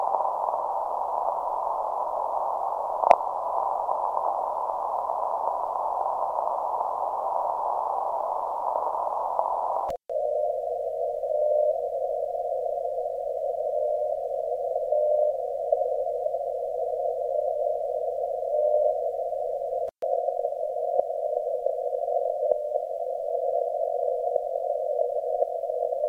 11.9KHz, Icom IC-R8600; NRD545; G33DDC; Alpha Signale

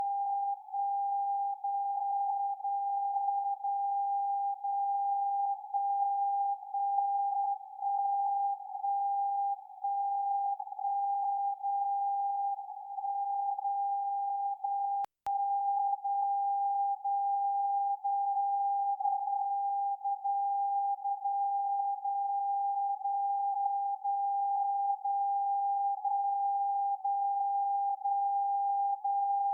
60khz Timesignal, CW, Zeitzeichen; 60Khz-CW; Anthorn England

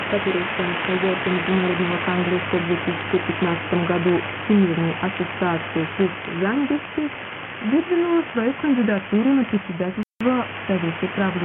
11965khz; Voice of Turkey

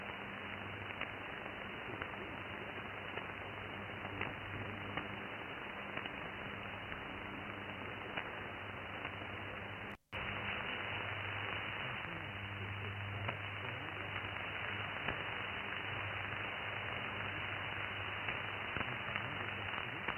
9720KHz AMS; Reach Beyond Australia; Reuter Pocket

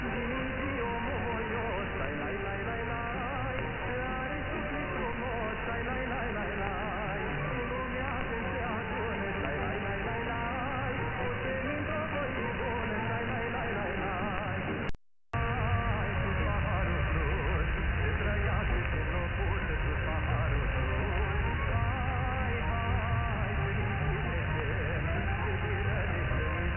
G33DDC 153khz; 153khz-AMS; Rumänien; Antena Satelor

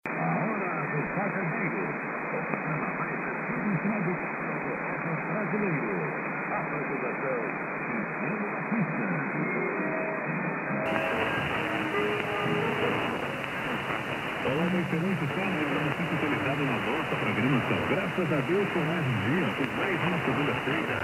Radio 15190khz LSB; Brazil; Radio Inconfidencia

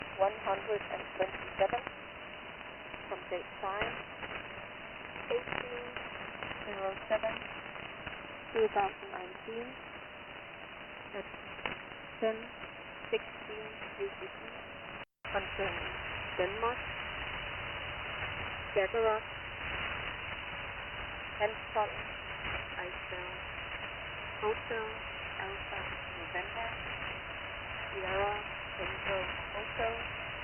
1734khz, USB; Dänemark; Lyngby-Radio

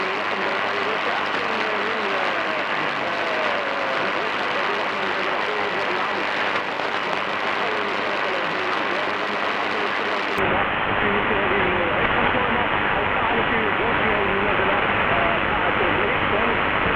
Radio 531khz AM; Radio Algier

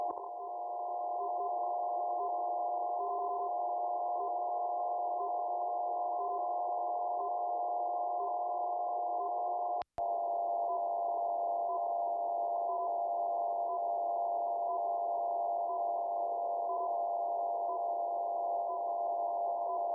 66.66khz; RBU

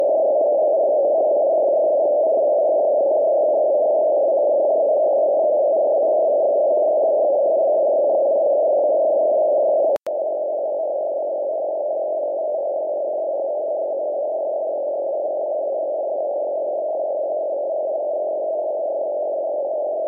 German Navy 23.4khz

